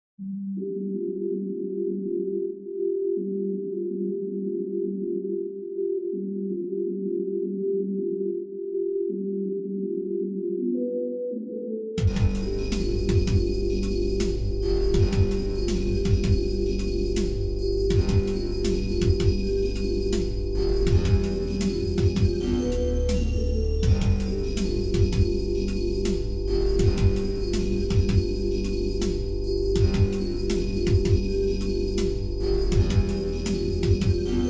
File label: Softsynths wet; Savant Audio QRS